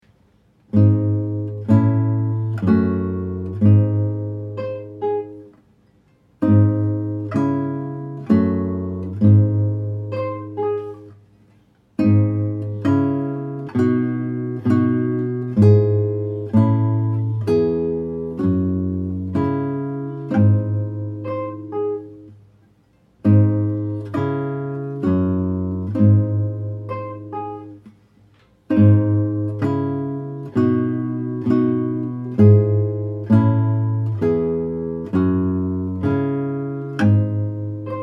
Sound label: cuckoo, guitar